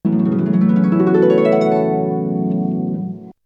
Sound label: Melody oneshot; ZERO G - Datafile DISC 3